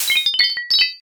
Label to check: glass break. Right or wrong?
Right